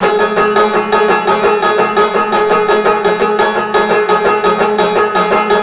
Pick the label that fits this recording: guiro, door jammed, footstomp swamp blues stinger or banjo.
banjo